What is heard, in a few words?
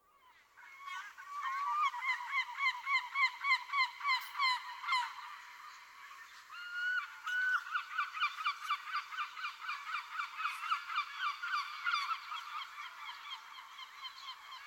Seagull Sound